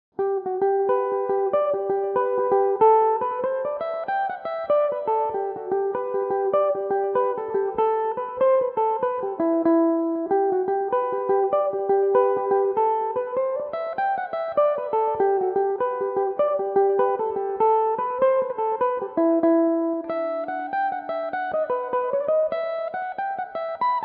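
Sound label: E minor, irish mandolin, tunes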